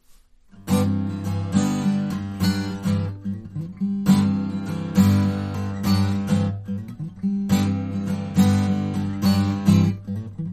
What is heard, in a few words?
live acoustic guitar folk tune